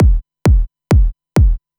Kick Loop, Dance Beats

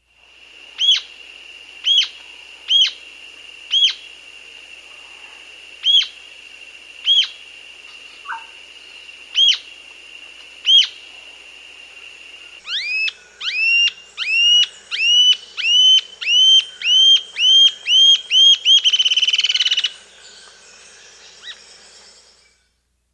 Rufous-tailed Jacamar, S. America

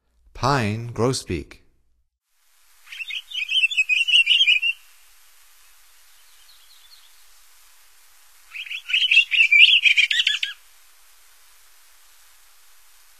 Pine Grosbeak, Songs of Birds of North America; Stokes

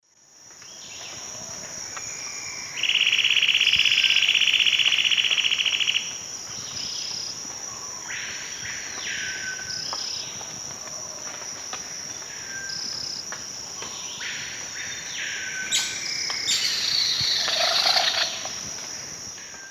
Conopophaga aurita, song and alarm call; S. America; Eastern Lowlands

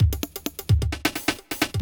electro kit 130

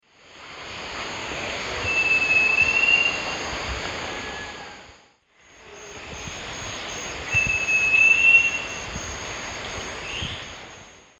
Jacamerops aureus, song; S. America; Eastern Lowlands

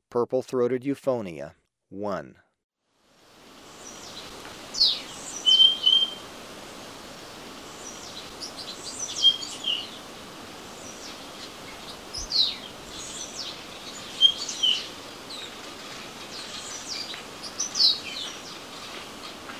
Purple-throated Euphonia, Ecuador; S. America

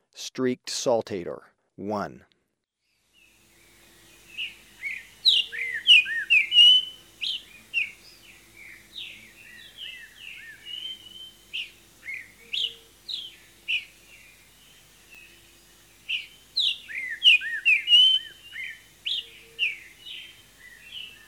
Streaked Saltator, Birds of Ecuador; S. America

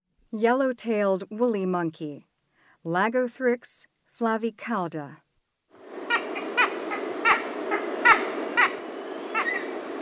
Yellow-tailed woolly monkey; S. America; Rainforest; Primates of the Rainforest

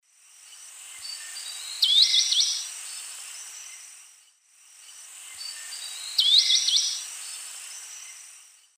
Myiopagis gaimardii, song; S. America; Eastern Lowlands

